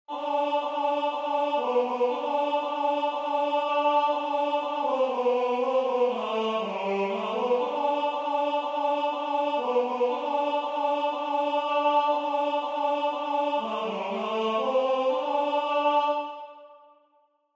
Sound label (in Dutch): t Is geboren Tenor